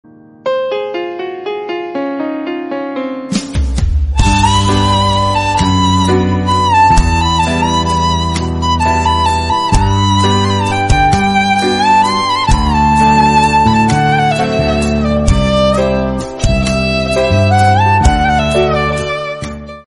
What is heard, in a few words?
guitar background music bollywood instrumental ringtone; bollywood ringtones